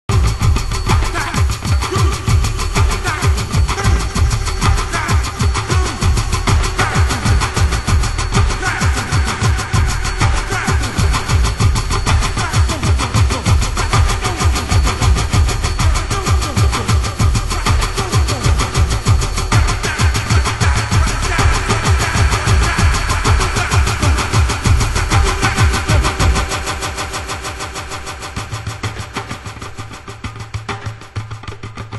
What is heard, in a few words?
drum